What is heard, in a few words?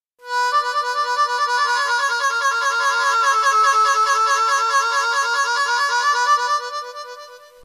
Effects, harmonica